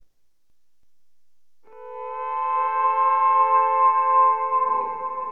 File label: STEEL; HipHop Guitar; LiveAct Pool 1